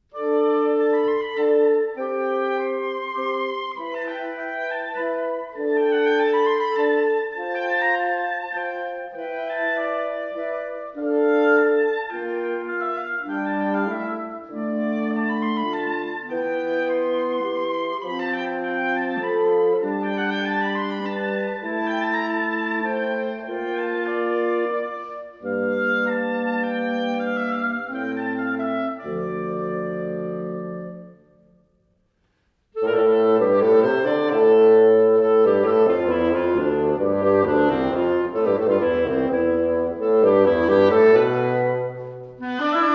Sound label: oboe minuetto; concert champetre